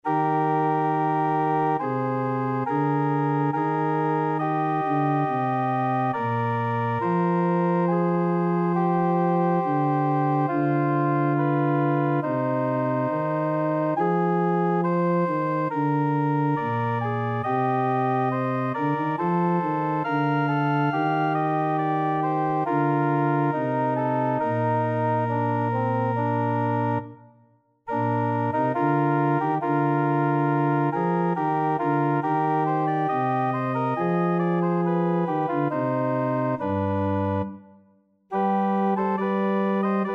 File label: TTB Organ; Benedixisti Domine; Ravanello